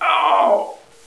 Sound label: hdeath, hostage